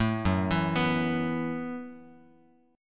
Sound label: Gb Minor 11th Gb, Bass Standard, chord; arpeggiated; JGuitar